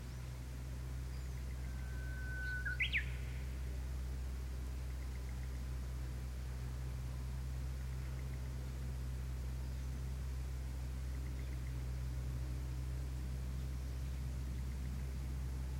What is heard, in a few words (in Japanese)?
uguisu